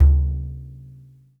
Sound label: Frame Med, World Percussion, One Shots; Sony CS